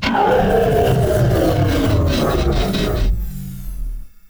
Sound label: die, cylarkan, creatures; combat; CosmicRageSounds